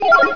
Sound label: logged in